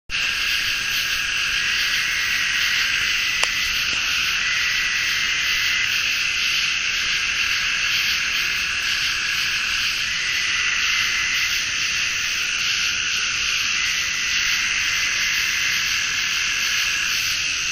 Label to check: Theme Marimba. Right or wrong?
Wrong